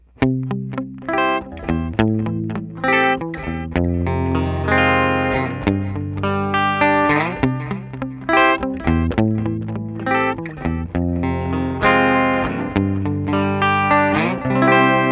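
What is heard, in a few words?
Rockabilly HR Bridge, guitar; Guild Newark St X175 Manhattan Special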